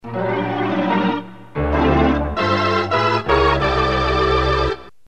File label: Organ